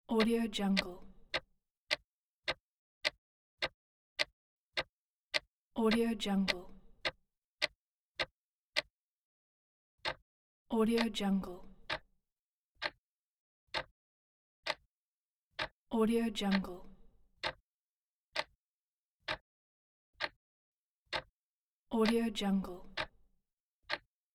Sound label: ticking clock